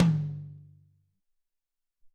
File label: Amb Tom M, Alesis SR-18